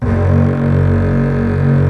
LO ST STRING

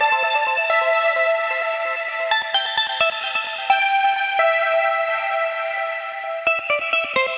130 A Phrase Echo Flutter; Phrases; Zero-G; Future Wave

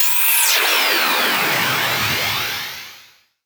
Sweeps Down; Effects; Vengeance; Essential Clubsounds Vol.4

